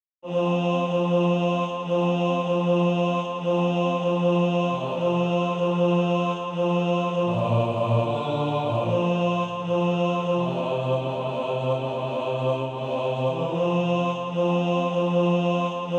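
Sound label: tenor, SATB Practise Music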